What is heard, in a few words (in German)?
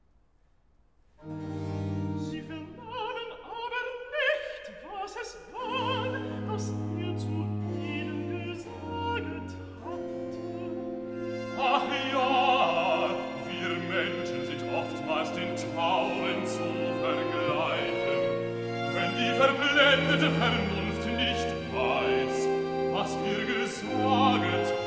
Recitativo Alt Bass, VOCAL; alto; BWV175; Sie vernahmen aber nicht; BACH